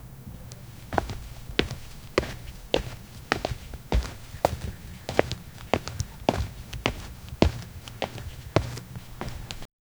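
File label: a man wearing sandals walking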